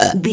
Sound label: comedy burp